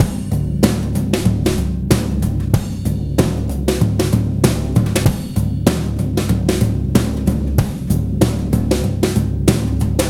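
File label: drum, Breakbeat; DOOM BOOM 095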